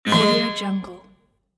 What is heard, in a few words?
robot error signal design